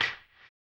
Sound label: HAMBONE, Vocal Blues Soul; Spectrasonics; Planet